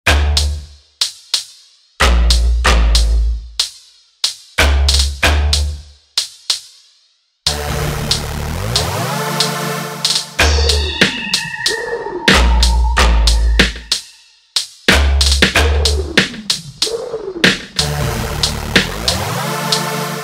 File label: Rap Battle Beat; Introducing Marble Pie; Psychoacoustic Music